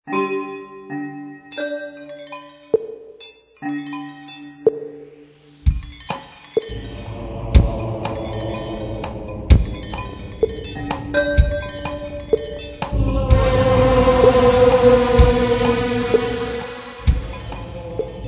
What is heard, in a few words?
Dirge